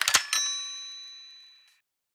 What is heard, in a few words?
perc; eddyflux